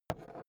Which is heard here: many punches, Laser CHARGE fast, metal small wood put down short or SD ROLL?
metal small wood put down short